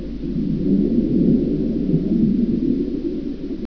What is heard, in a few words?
underwater